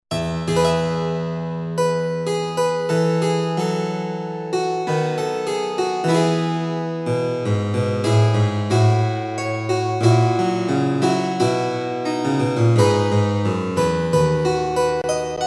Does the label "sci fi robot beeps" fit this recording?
No